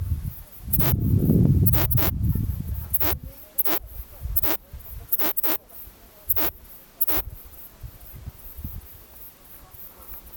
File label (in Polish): siodlarka stepowa; zamek Mirow; OrzechowskiR